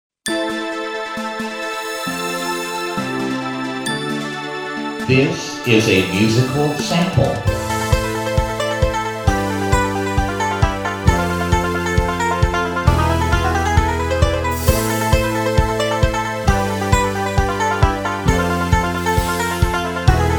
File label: Banjo CLOG; Sound Alike Tracks